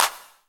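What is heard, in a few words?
snare drum; Best Service Gigapack 2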